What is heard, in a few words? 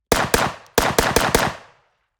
glock 18 1m left off axis clean six shots; mkh416; sonniss gdc